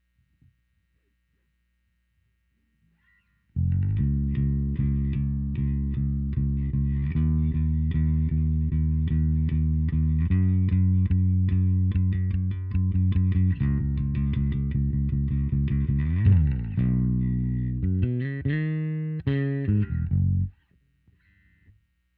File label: new Bass